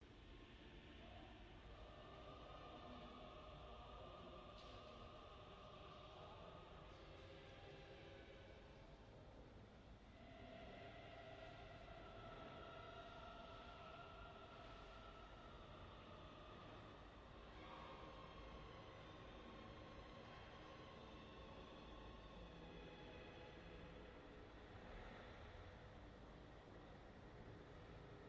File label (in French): Ambiance fonderie; Mozart